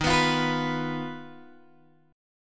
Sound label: D sharp Suspended 2nd Sharp 5th D sharp Guitar DADGAD